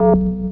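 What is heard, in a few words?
check, chinese chess; flutter chinese chees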